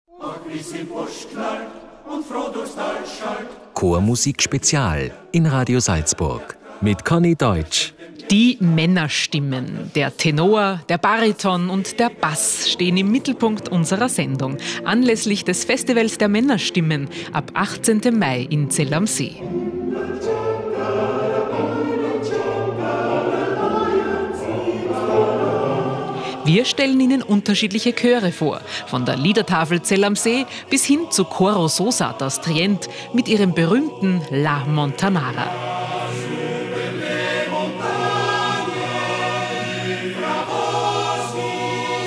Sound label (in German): Chormusik; Zell a See